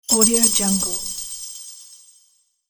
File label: sparkling cave magic